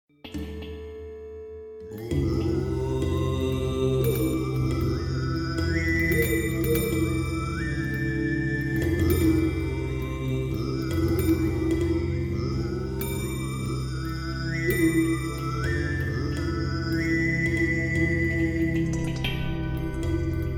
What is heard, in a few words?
Drone